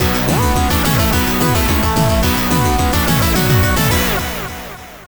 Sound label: Dead End (Game Over); thunder force iv; genesis